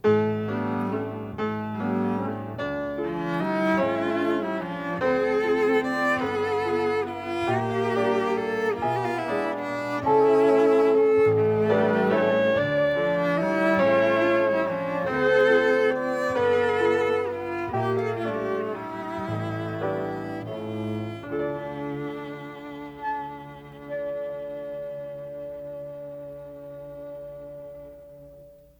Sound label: Elegance With Aching Clarinet